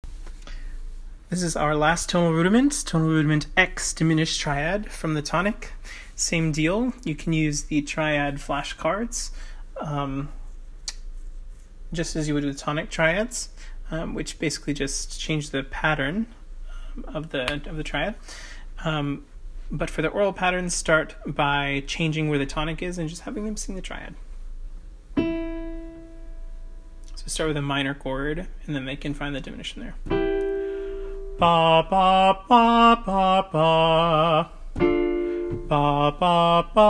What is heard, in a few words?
tonal rudiment